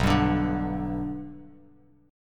B Minor Sharp 5th B Bass Standard, chord; strummed; JGuitar

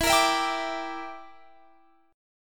E Minor 7th Flat 5th E, Guitar Drop D

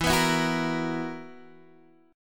B Major 7th Suspended 4th, guitar chord

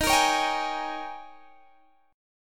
D sharp 11th D sharp, Guitar, Open G

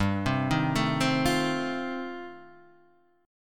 F 7th G, F7/G chord; F7/G arpeggiated

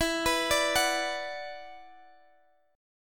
E 7th Suspended 2nd, Guitar, Standard; E7sus2; arpeggiated; x,x,14,16,15,14; down; capo=9

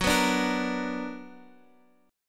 B Minor Major 7th Gb, chord

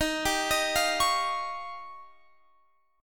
D sharp 9th D sharp, Guitar Open F; D#9; arpeggiated

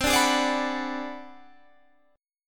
Ab 7th Sharp 9th Flat 5th, chord

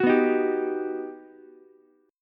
G Augmented Major 7th, Ukulele; G+M7 strummed; JGuitar

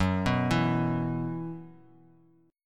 F sharp 7th Suspended 4th, down; arpeggiated

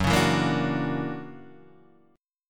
Fsharp 7th Flat 13th, guitar chord; F#7b13 Chord {2 4 2 3 3 2} strummed

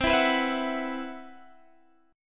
C Major 7th Flat 5th, chord

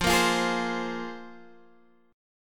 A sharp 7th Suspended 2nd F, guitar

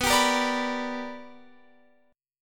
B Minor Major 7th Sharp 5th Guitar Open F; BmM7#5 strummed

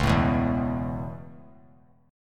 C sharp Minor 7th Sharp 5th C sharp; C#m7#5; strummed; JGuitar

